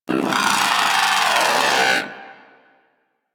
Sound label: Moa Aggressive Vocal Death; Warframe